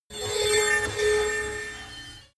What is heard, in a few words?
magic warp relocate building short high; horror